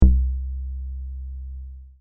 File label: Sub Bass; instrumentos; baixos; Virus